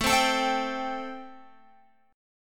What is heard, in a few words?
A-Minor 7th A Guitar; x,12,12,14,12,15; strummed; Am7